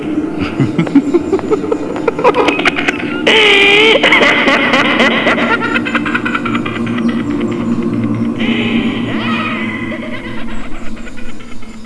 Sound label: clown laugh; The Laughing Clown